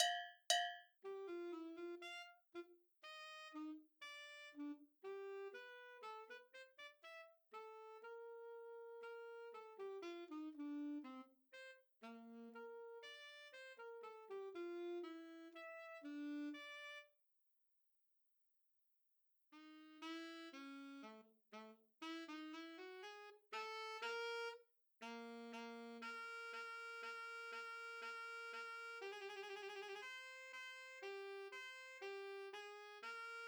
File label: Alto Sax Classical